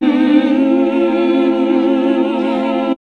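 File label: classical singing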